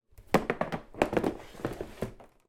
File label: household highchair tray slide on chair